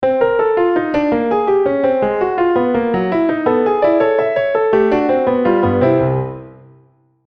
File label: Tonal circle